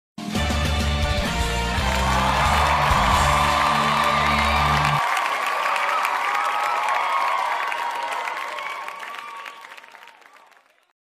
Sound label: cheering; genially